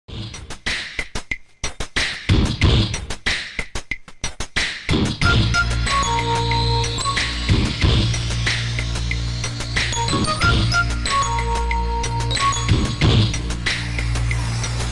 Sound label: bgm